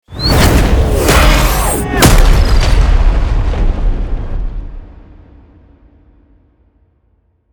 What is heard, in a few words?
fesh fesh